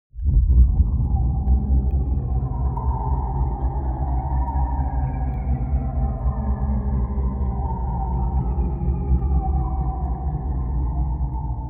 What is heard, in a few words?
space alien invasion